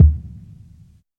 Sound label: Kick HEART